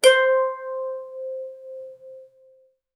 harp; studio quality

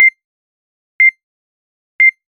for a social party video, countdown

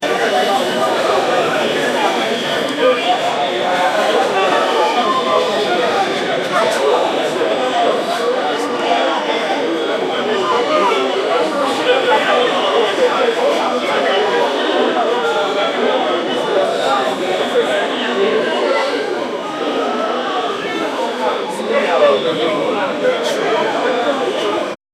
crowd noise inside a hotel

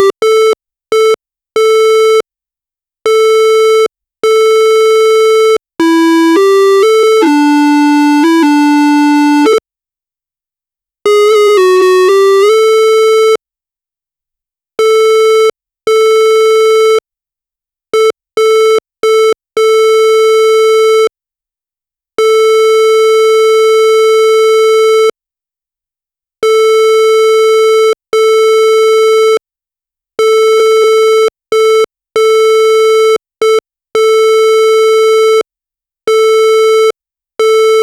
something went wrong fail, error

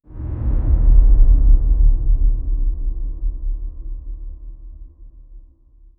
dark shadow forming low; darkness

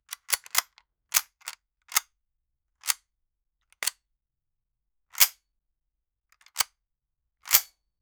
pistol reload; weaponry

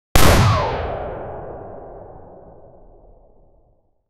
ak 47

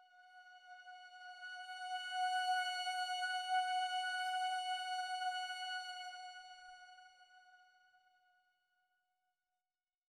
a powerful cinematic hera, trumpet

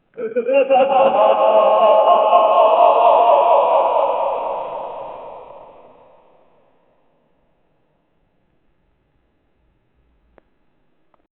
laughter in the distance, ambience

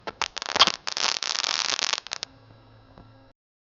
the sound of crystalizati, crystal

